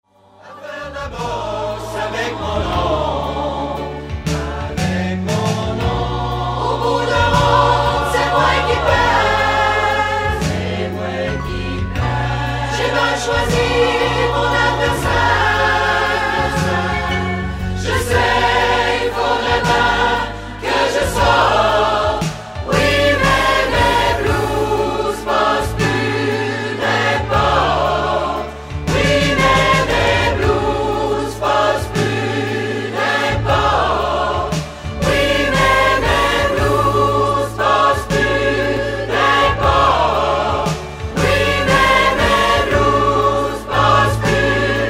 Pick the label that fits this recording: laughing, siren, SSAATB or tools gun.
SSAATB